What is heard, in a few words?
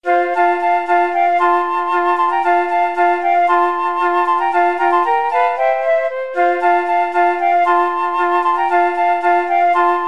flute trio